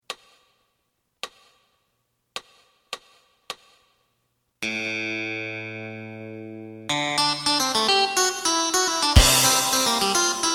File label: bass